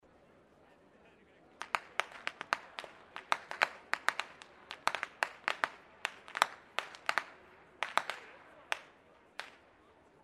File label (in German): schnalzen, jugend; waging